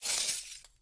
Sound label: glass jump; firefight